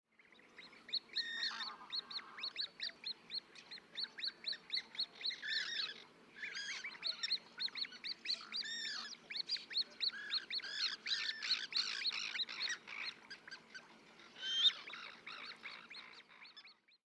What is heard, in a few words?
Eared Grebe Podiceps nigricollis; waterfowl